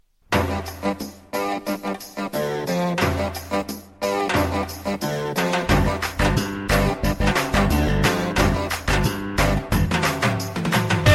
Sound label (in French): Bulgarie; Tyk-man, Myk-man